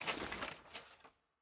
sfx ui record next click

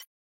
ui generic hover

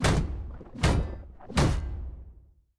drums; pantheon

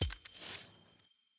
uikit button pageup click

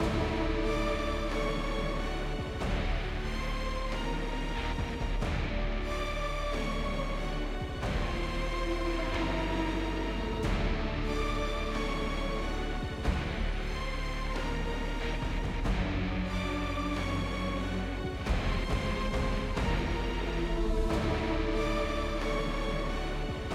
mood loop dramatic; viego

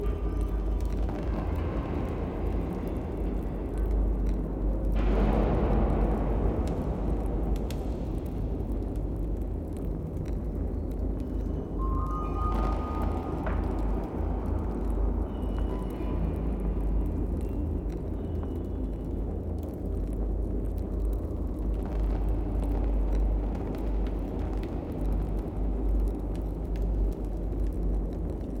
amb

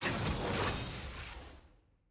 draft left pick single

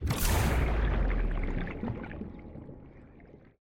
action dive

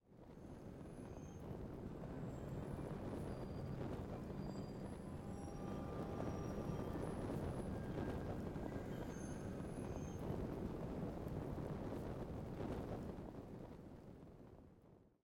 hover; eternals

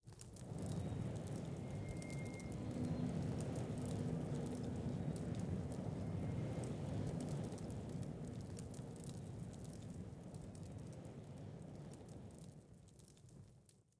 hover; lol; eternals

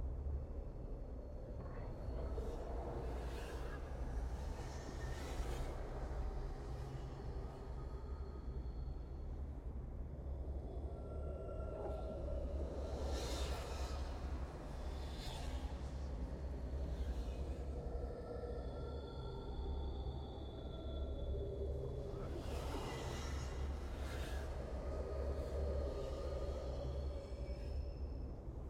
amb interior; noxus

